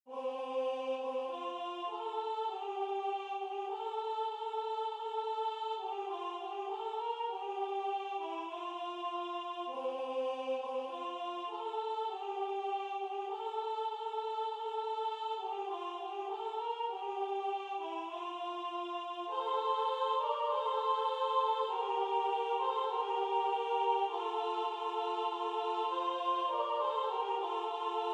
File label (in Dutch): Vrouwenstemmen